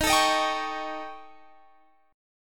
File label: Eb 7th Flat 5th, Eb; strummed; x,18,19,20,20,21